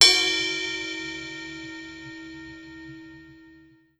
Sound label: Crash; pitchglitch samples; Forzee